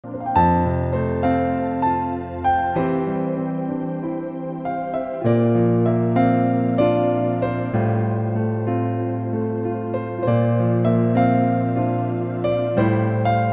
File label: instrumental